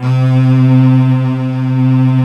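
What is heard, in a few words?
cello G; AKAI S-Series CD-ROM Sound Library VOL-2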